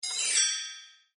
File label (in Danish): Klokkespil ringetone til mobiltelefon